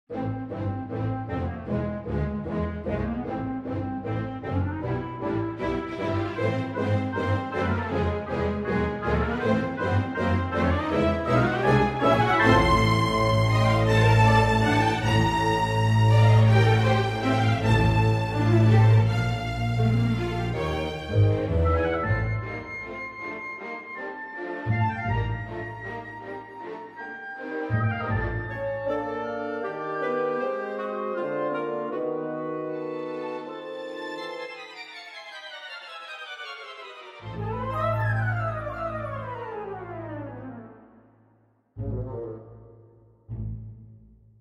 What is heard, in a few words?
wood strings